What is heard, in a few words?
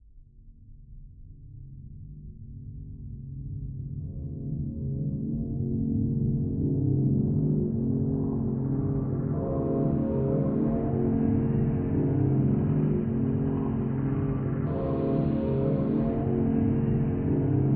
ambient dance